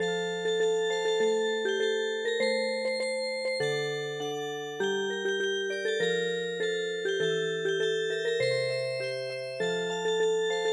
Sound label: Music Box, traditional